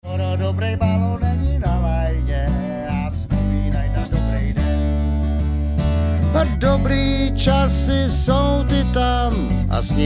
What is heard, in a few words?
vocLead, bluegrass